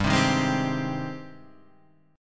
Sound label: Ab Major 7th, chord